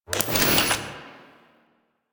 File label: TV Turn On; Warframe; NineteenNinetyNine